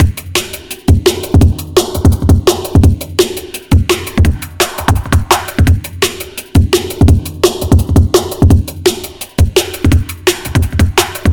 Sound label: Loop22 Ultraphaser 4 lfo; Zajo; Rwrk